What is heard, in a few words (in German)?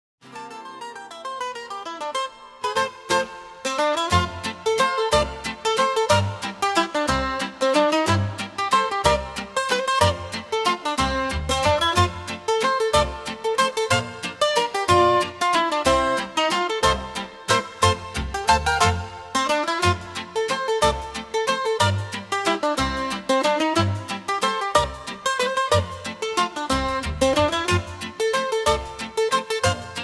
Zither und Gitarre; Alpen; Carussell